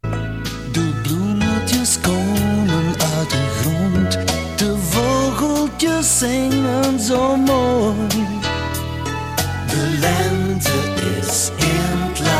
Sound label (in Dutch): Jingle lente; Seizoenen